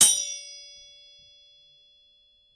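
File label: Samurai Impact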